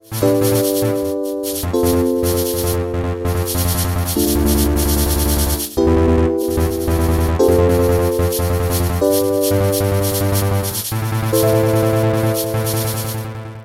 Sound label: Chill Out Sms